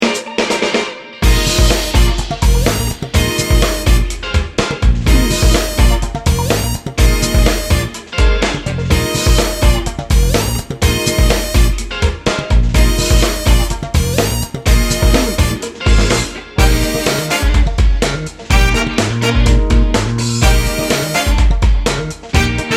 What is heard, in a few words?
feel good